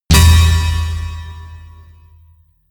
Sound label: anvil drum hit flam; hits explosionen